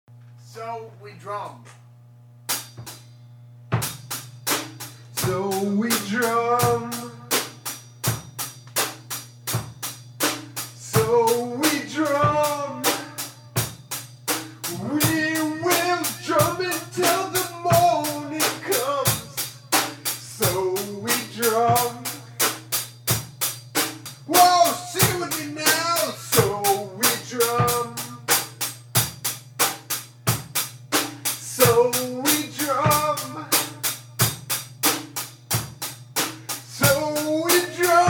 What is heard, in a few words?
So We Drum, jam